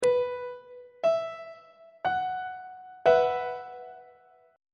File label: b suspended 4th chord on treble clef